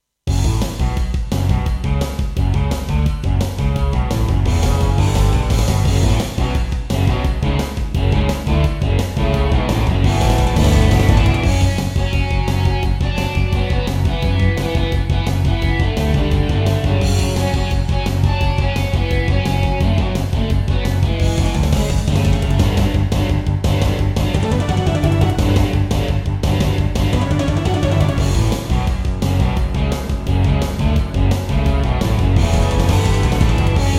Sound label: Intro, Roland SD-50 GM2 MODE; Duke Nukem 3D